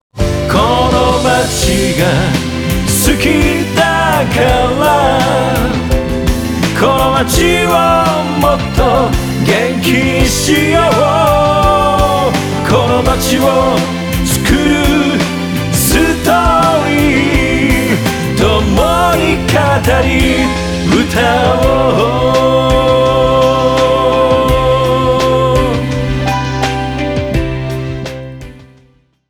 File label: Short 8beat song